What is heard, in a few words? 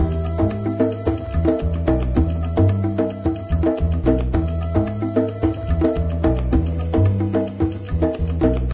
Japanese Music; Diner Dash 2 Restaurant Rescue